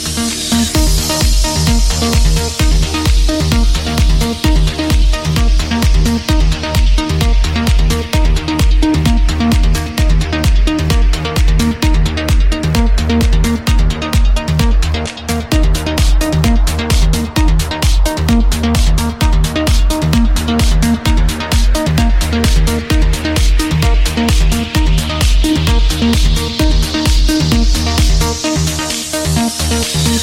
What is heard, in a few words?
Ramp Walk Music Ringtone; Android Mobile